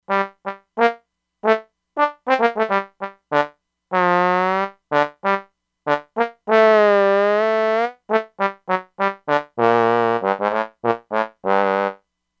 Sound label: Trombone, E-mu Proteus-2; Factory Presets